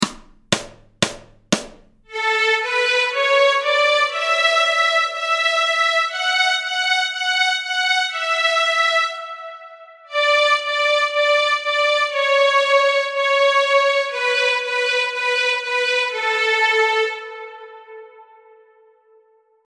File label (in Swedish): Petronella Stråk A, Fiol